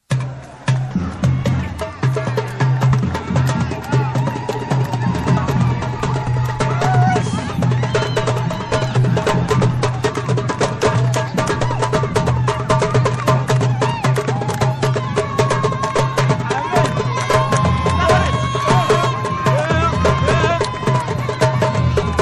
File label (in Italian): Manifestazione antirazzista Tamburi e grida Marocco; roma forestiera